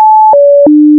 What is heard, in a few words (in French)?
notes 2 kHz